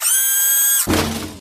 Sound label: upgrade; csco; csgo; zbm3